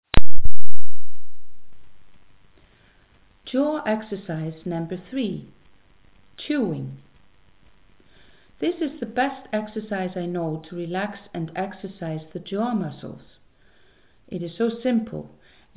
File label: chewing